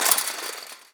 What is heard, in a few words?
fence; firefight